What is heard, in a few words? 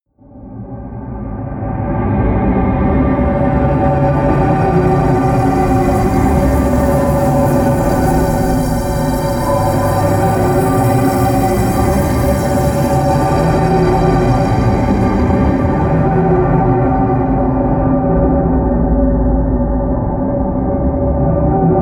drone moving air high